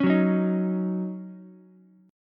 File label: D sharp Minor 7th, Ukulele; strummed; JGuitar